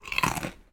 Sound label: bite